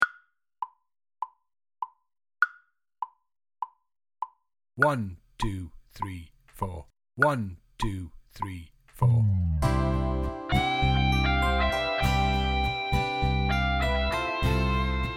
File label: Soft Rock Cafe Backing, drum kit